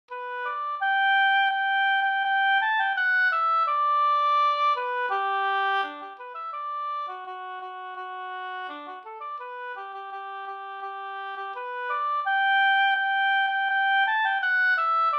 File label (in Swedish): brudmarsch; e NA Oberg